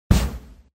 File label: pillow destroyed; yeeps